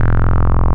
Pulse, wavetables; Animoog

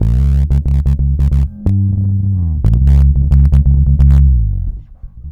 C#, bass; Spectrasonics; Bass Legends CD 1; S.BRONX

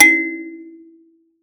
Finger, Prepared Kit; Battery 3 Sample Kits

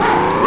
Sci Fi Loop

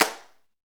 PRC XCLAP, Hand Claps

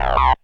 BLURP LOW, Hard & Loud Techno

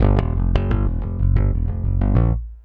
Rock, Bass; Bass X

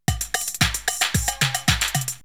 Drumloop; ZERO G - Datafile DISC 1

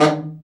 BRA BARI, Bariton Sax

